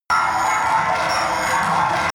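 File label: alien workshop hall, science fiction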